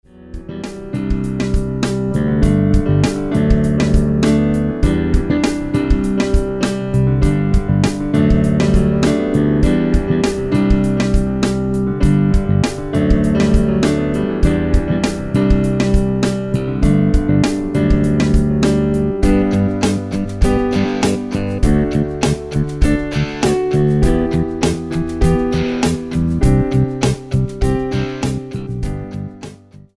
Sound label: Acoustic Rock